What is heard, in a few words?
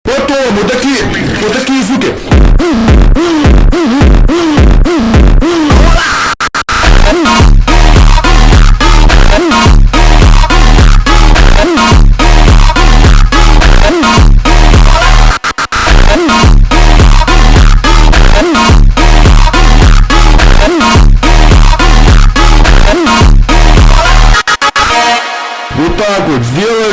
Pitao Funk Slowed